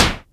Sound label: SYNCUSSION